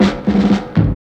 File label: FIL S, Fills; AMG; Now CD-ROM (Roland)